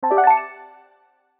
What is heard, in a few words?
Musical Accent, Musical Accent Keys; Musical Accent Keys Strings; Keyboard Sound Effects; Blastwave